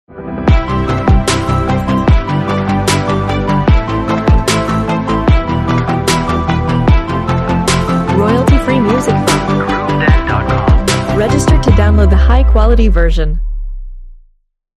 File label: Business Logo, Stylish and modern composition